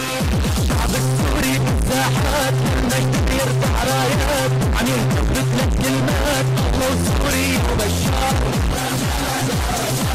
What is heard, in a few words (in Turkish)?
suriye milli marsi